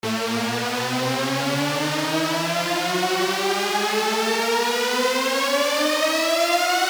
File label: slide